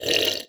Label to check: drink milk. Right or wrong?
Right